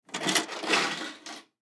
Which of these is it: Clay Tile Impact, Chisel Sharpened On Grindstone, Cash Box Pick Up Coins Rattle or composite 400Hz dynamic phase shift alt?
Cash Box Pick Up Coins Rattle